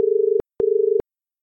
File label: Au Ringing Loop, tones